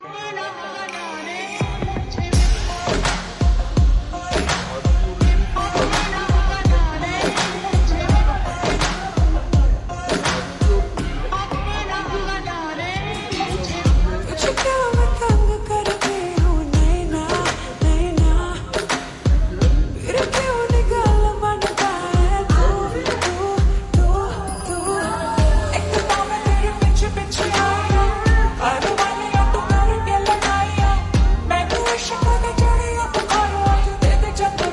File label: Slowed Reverb Ringtone